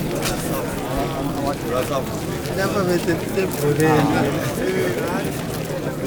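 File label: AFRICAN CROWD; Spectrasonics; Heart of Africa 1; AF.CROWDS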